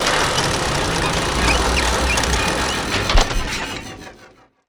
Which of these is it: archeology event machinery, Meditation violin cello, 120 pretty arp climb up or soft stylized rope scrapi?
archeology event machinery